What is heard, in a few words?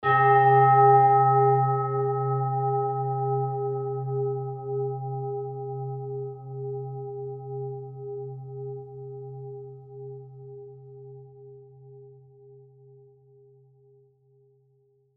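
tibetan bowl om set; uranus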